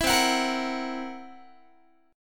C 7th Flat 5th C down Guitar; C7b5 strummed; 19,20,21,20,22,x

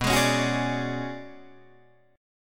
B Minor 6th Add 9th B, Guitar DADGAD

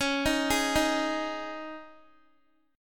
Db Suspended 2nd Guitar 4F,4A,5C,5G,5C,6E; arpeggiated; x,16,15,13,15,x

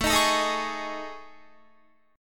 A Major 7th Flat 5th, down Guitar; AM7b5; strummed

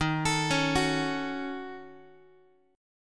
D sharp Minor 7th Flat 5th D sharp, down, Guitar; D#m7b5 arpeggiated; x,11,12,11,10,x; 4A,4E,5A,5D,5G#,6C#

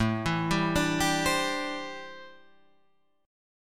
A Minor 11th A down, Am11 chord; Am11 arpeggiated; JGuitar

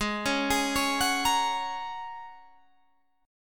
Ab 7th Suspended 2nd Suspended 4th; 16,16,18,18,19,18; arpeggiated

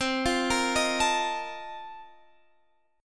Bb Major 7th Suspended 2nd Suspended 4th

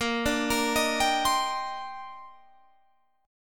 D sharp Major 13th, A sharp, guitar chord

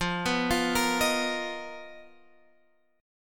B Major 7th Flat 5th F, chord shape

